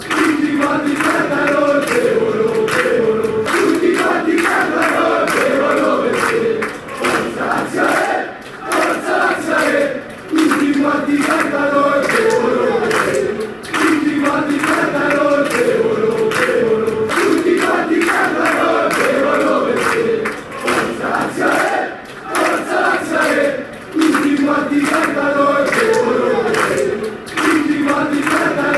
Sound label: drinking, fanchants